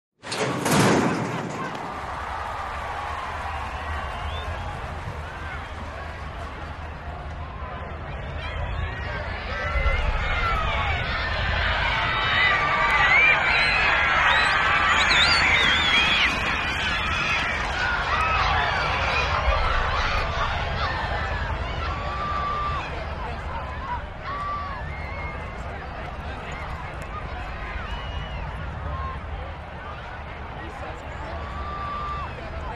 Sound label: Horse Race Sequence